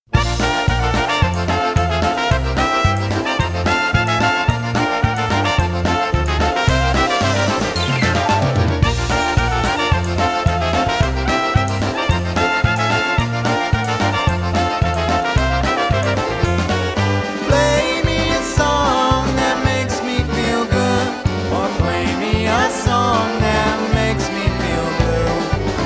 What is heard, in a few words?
Polka